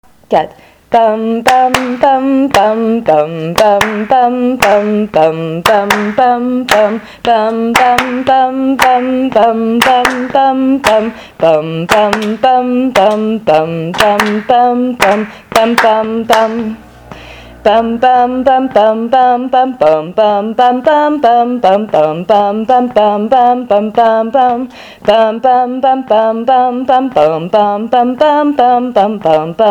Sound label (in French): los peces voix basse